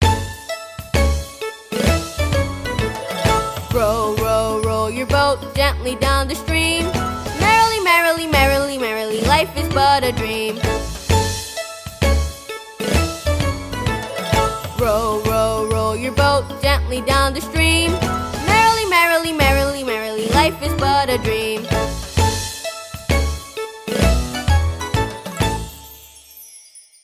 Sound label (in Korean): 영어여 동요 eng